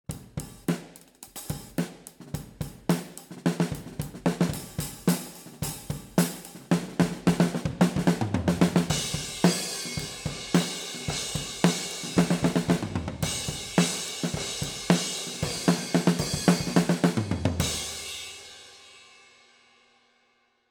Kick Head Off; OH

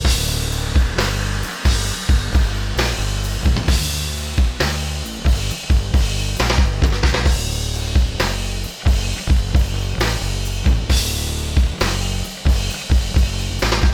Kick Bass Ducker

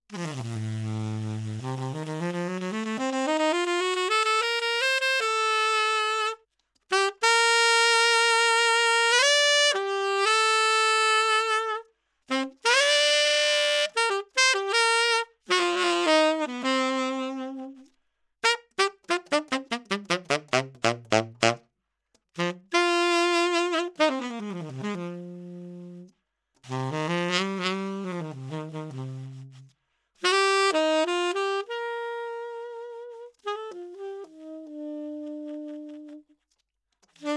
Sax Tenor 20cm Low Mid Bell

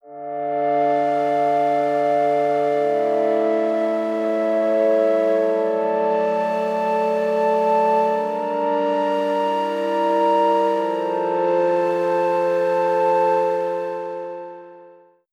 Pad Stereo

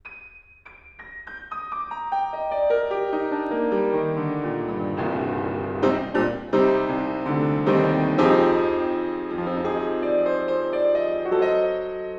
Piano, Vert 85cm Mic

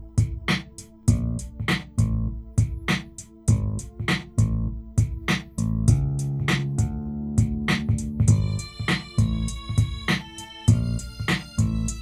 Speaker Foldback Cancel Pass Omni Spill

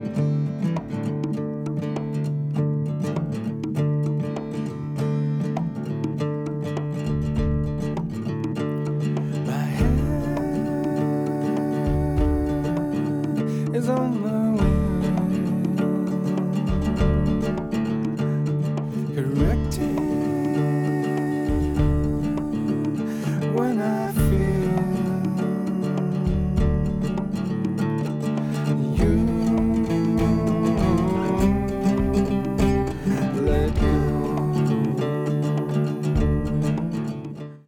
Note Sus Pad Out

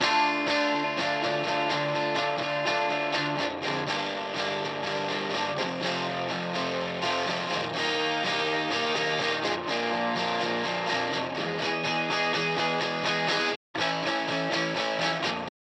Gtr B Overdubs

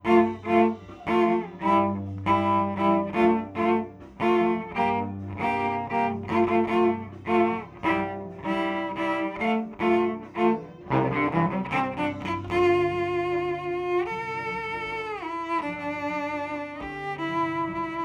Cello DI Tone

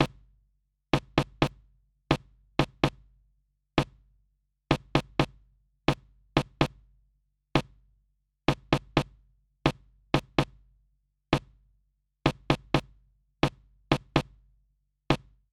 Kick Sample Solo

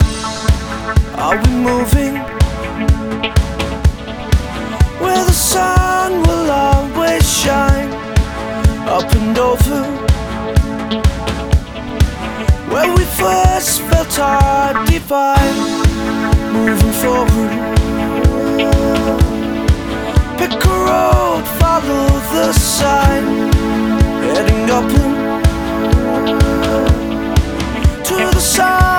Vocal 2 Context

